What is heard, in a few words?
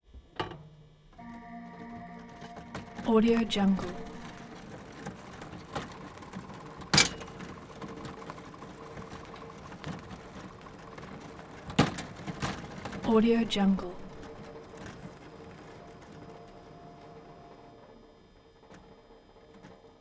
electric car dragging plastic maneuvers